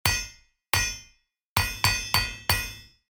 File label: JINGLE Blacksmith; Monster Girls and the Mysterious Adventure 2; windows